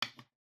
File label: Piece Pick Up; This Is the Police